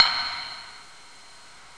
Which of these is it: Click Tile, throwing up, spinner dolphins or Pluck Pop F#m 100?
Click Tile